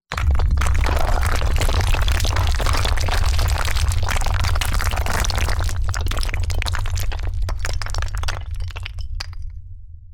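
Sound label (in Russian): камнепад с гулом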